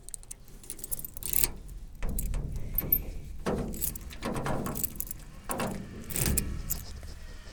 Closing Gate With Keys

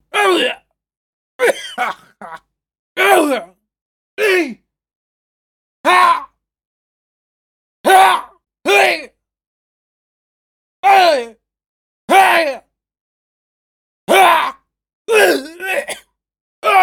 Quick Death Screams Multiple Takes Mixed

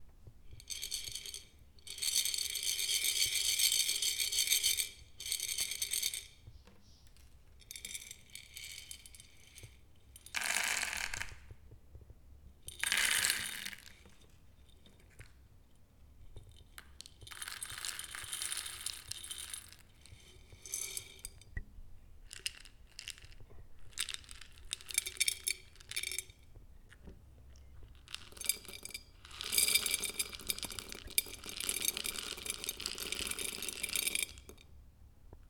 Rolling Pebbles

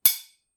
Sword Strike

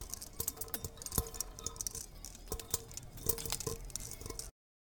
Ice Cracking And Popping

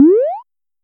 Cartoon Jump

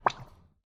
Boink

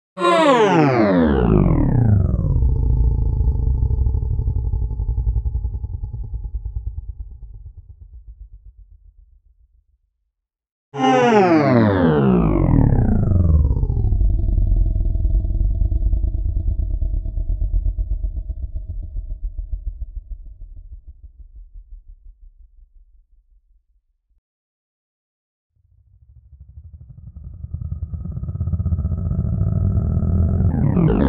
Saw Sci Fi Generator Shutdown; Qubodup

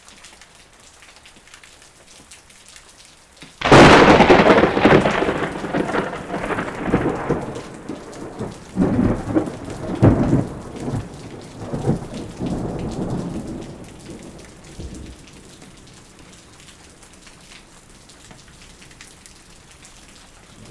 Strong Thunder During Rain

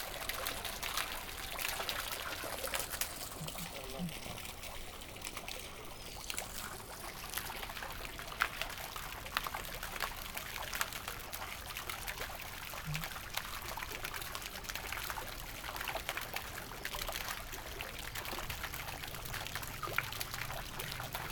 Fountain

